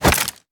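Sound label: melee attack gun medium; viper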